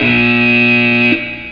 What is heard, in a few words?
Buzzer Sound; Pushdown Machine 2.3